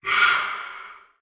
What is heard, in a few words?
roedeer call